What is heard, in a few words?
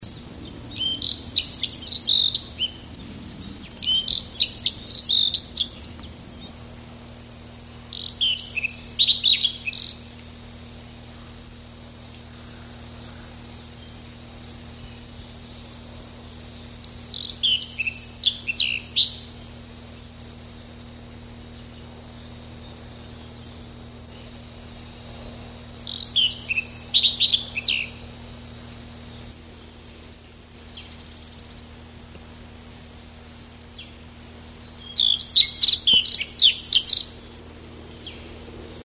E spodocephala